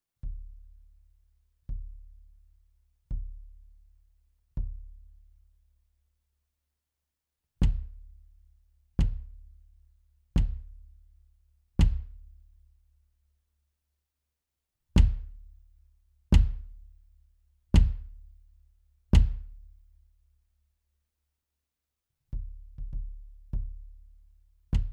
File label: FUNK